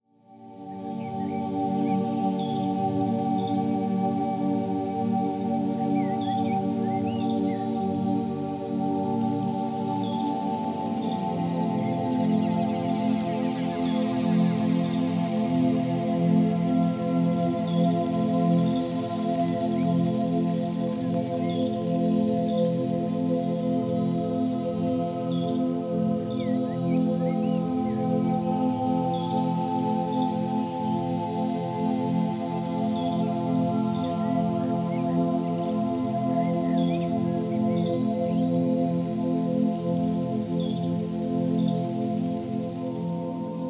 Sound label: instrumental snippet